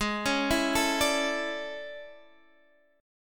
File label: A Major 11th; chord